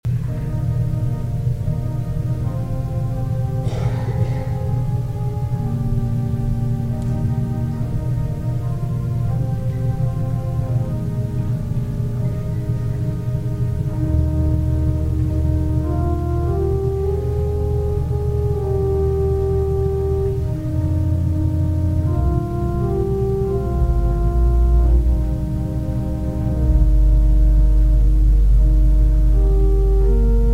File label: organs; First Ave Pres; AGO; Gethemane